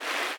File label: fast water, river sounds